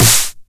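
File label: 909 SD Tape, snare drum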